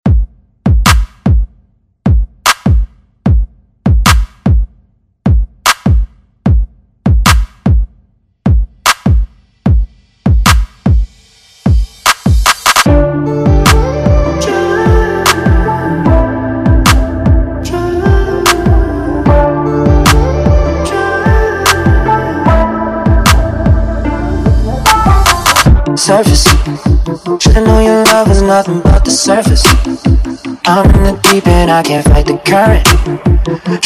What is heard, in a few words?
Top40 Redrum Intro